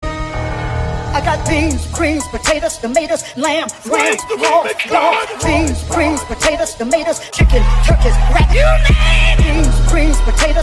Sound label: thanksgiving remix